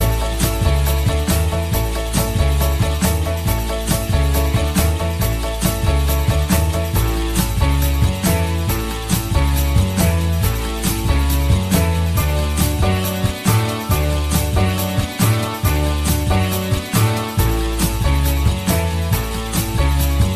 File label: Ring Ring